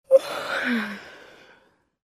Teen girl yawning

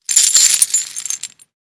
Old rusty chains rattles and clanks

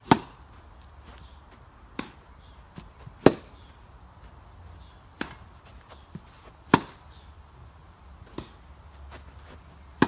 Tennis rally grass court

